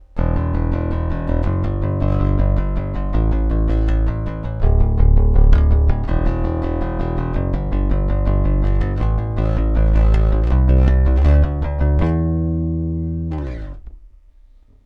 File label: Neck active pick